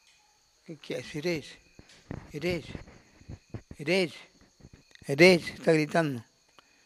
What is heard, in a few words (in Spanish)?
gritando